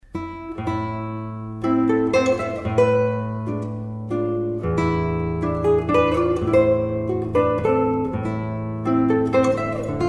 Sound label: mazurka Fragment